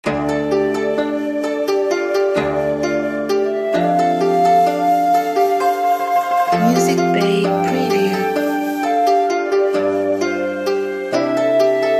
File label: Asian Chinese Life